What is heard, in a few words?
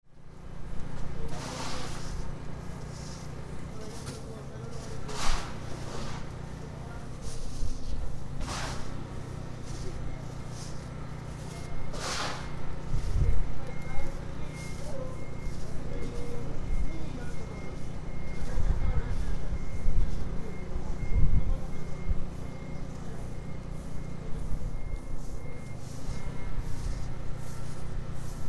decontamination; fukudai